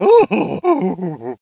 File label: Zombie Laugh